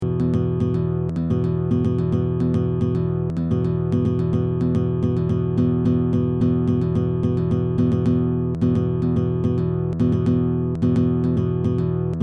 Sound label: guitar